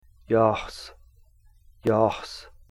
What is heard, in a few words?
yaahs